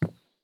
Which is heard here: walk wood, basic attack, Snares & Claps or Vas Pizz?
walk wood